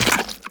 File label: Run Goo; Footsteps; Warframe; Tenno